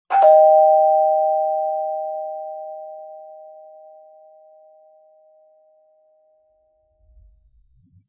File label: doorbell; qs-housing; WestsideRP